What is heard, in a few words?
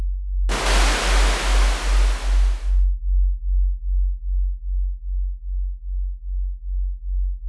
ashflow, ambience